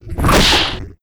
WAR ATTACK, Mob

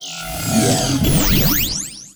ROBBER WAR POWER UP; Mob

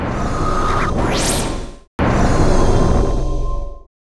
striking

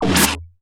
Lava damage

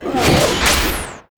T Destroyer attack

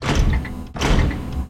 CATAPULT COMBAT WALK

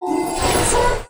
RECRUIT TAKE UP; BARAS; Mob

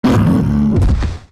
CANNIBAL DIE

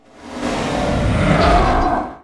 Die, Mob; Talaven

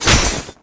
Spear cloth, Damage

